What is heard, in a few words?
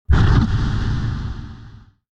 Stomp; Satan